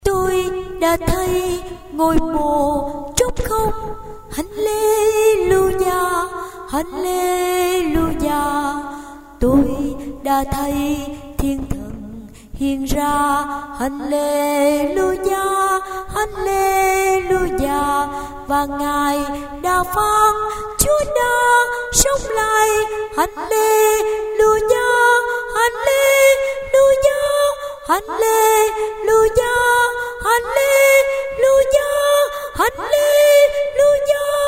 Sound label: tenor